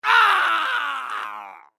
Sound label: k o scream; mk9 shang tsung